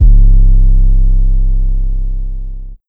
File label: Kick 808